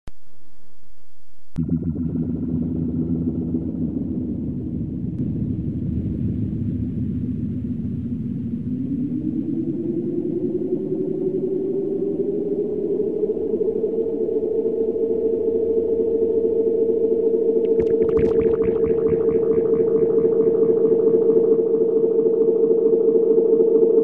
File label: Microsynth